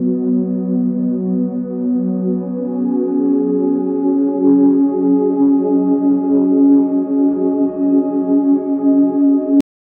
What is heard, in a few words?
emotional ambient pad